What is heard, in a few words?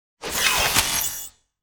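tripmine explosion digital; weapons; Half Life Alyx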